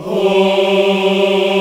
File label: AFRO AH G 3, CHOIR AHS; Spectrasonics; Heart of Africa